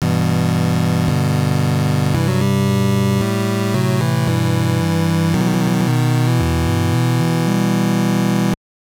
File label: Game Over (Player Dies); 8 Eyes; NES